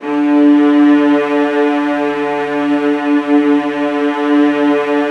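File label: VIOLAS E3; ILIO; Synclavier Strings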